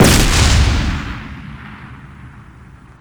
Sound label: turret aa missile explosion